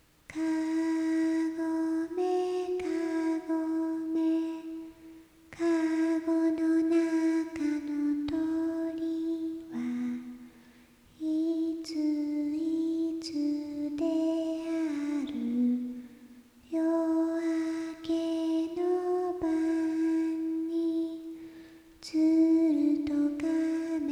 kagome; かごめかごめ; かごめかごめ 一人

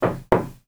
doa; ドア・ノック１; 普通のドア 木製ドアをノック

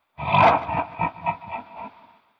warau

